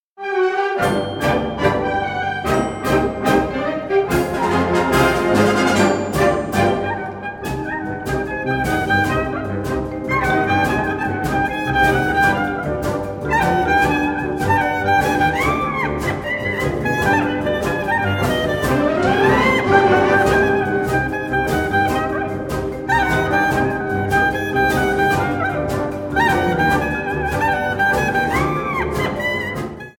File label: Freilach